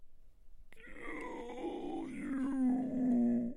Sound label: zombie stabbed